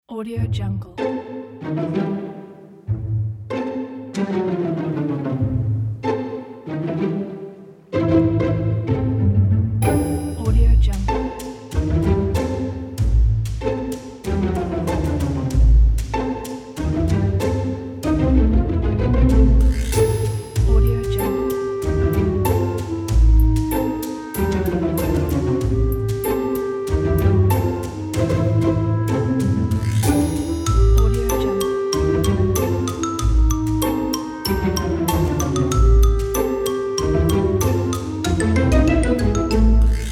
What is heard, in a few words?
comedy sneaky